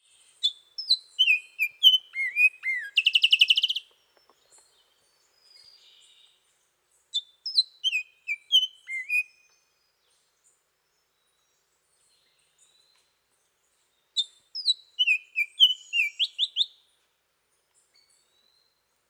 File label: Song and call, Birds of the Costa Rican Cloud Forest